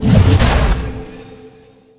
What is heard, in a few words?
Energy Dome Disperse; Energy & Forcefields